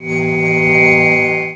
ROCK

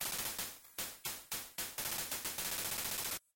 Bonus Slots Start; Genesis; game soundtrack; Magical Hat no Buttobi Turbo! Daibouken

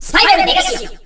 ROCK

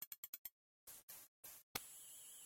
Splash Screen; Sega Channel Demo Cartridge #4; Genesis

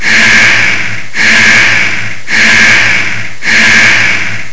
Alert

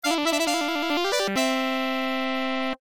Item Collected; Quack Shot; Genesis

